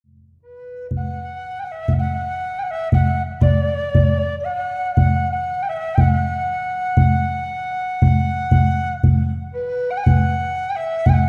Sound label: Peso Whistle